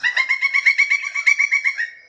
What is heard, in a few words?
Pileated Woodpecker